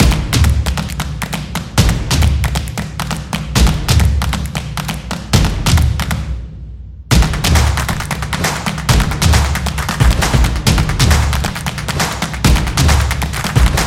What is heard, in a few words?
Percussion Solo